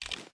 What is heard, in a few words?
reload empty fast xmag start; gmod